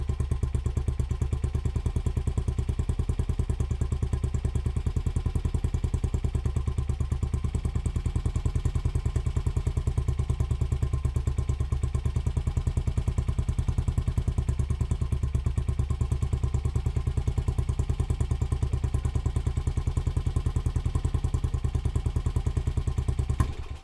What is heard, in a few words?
Triumph 250 trophy